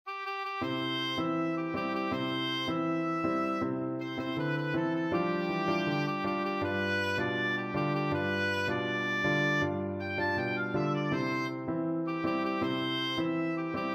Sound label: la cucaracha, oboe